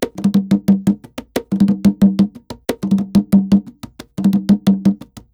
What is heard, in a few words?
ETHNO PERCUSSION 90; ethno2